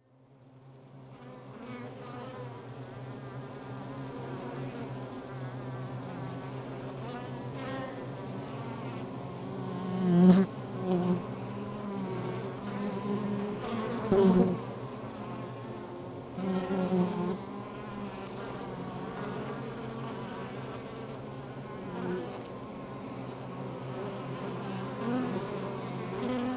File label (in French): Ruche Exterieur